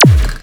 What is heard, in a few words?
mix, Production Effects; Best Service - XXL Production Effects